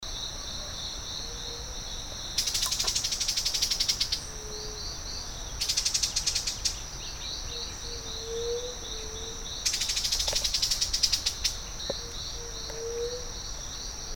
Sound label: Plain Wren Scolding; Las Mozas PA2